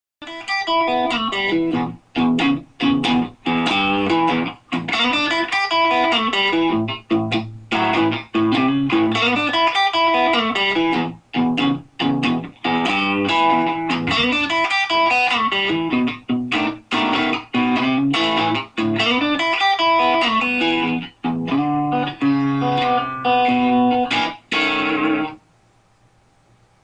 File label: blues lick in e